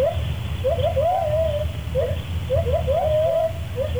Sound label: gibbon